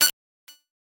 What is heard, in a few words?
Digital Shorty G 5